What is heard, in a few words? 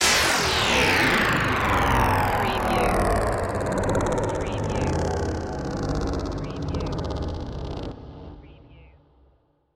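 Robot Dies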